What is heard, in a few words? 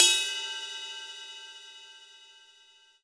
drum crash f sharp key; Cymbal drum one shot samples